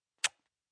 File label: Kiss Short Peck, Kisses, 1 child, quick peck, human voice, kid; Kissing; VoiceBox